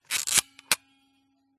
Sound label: smena symbol camera wind film lever; dpa4060; PMSFX